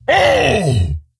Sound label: Wound Crit; Kologarn